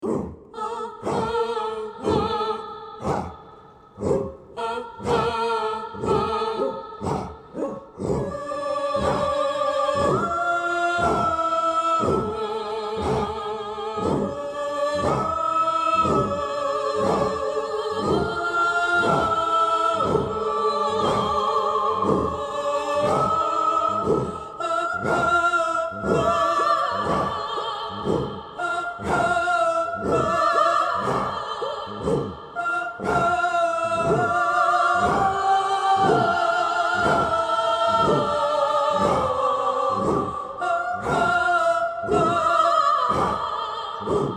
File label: Orc March Choir